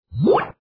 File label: WEAPON LASER VACUUM (reverse)